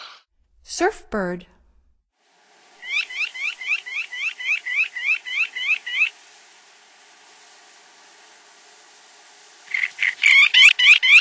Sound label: Surfbird; North America; Stokes